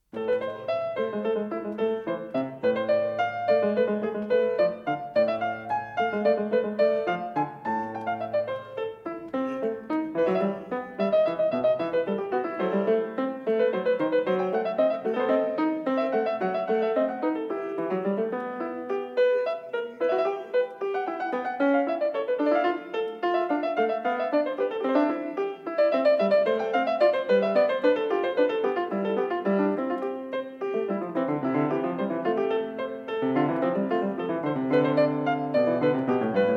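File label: Prelude in a, piano; Bach; WTC Bk1; Prelude & Fugue 20 in a; BWV865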